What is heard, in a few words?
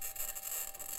mic, mars2020 edlcam